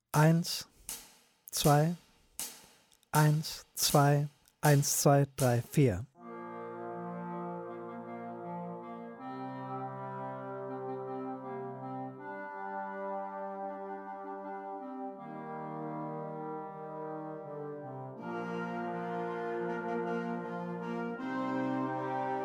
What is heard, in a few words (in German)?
Schlagwerk Glockenspiel; Vaiana